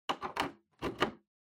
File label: TV Hardware HDMI Plug In